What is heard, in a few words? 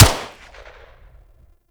Pistol Shot, Weapons; Harlequin